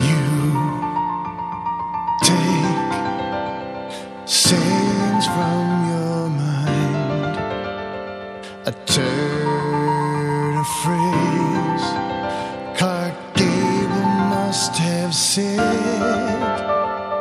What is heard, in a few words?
chillout singing dance 432 HZ